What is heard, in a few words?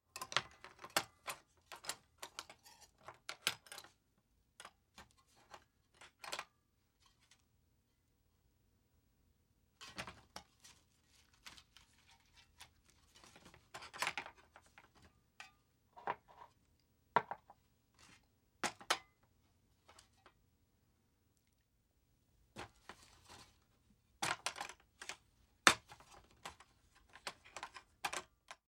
Emergency Surgery Instruments Medical Treatment; Emergency Vehicle